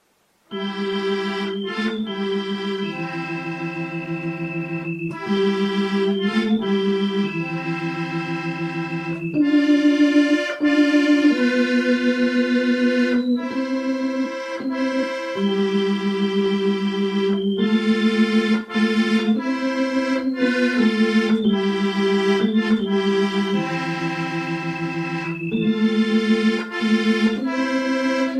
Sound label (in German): Stille Nacht weich Keytar